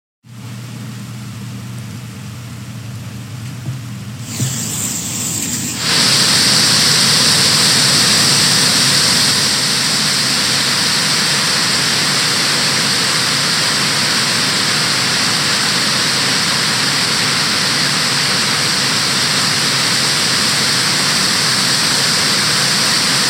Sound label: rain